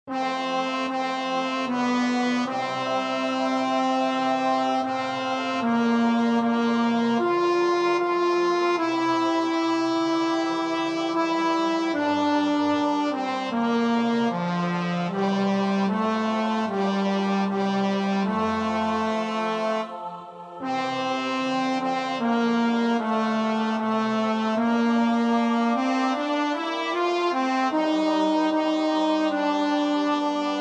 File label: tenor; gibbons; the silver swan